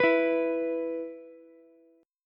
E 5th, Ukulele; strummed; JGuitar

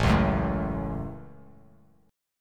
A 6th, guitar

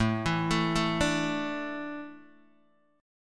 A Suspended 4th, down, Guitar, DADGAD

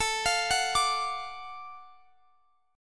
F sharp Minor Major 7th Sharp 5th A

